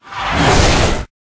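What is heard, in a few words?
Dragon Skeleton attack mid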